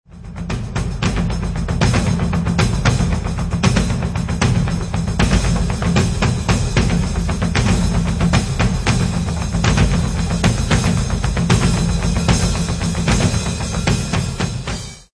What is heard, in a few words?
Taiko; drumlet